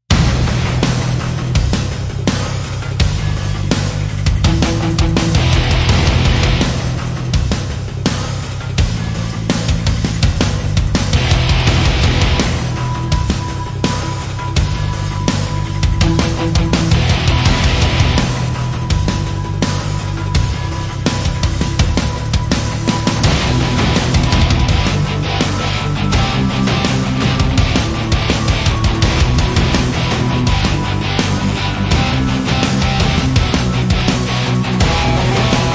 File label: Band Only; Gothic Storm Music